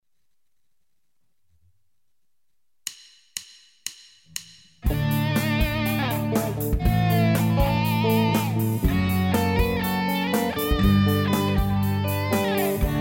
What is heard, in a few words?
Lead Guitar